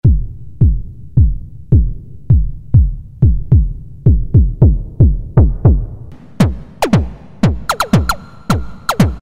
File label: Kick, Roland Alpha Juno-2; Factory B